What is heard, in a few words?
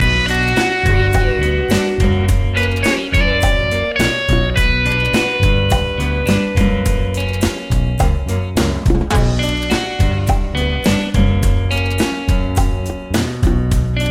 latin heart loop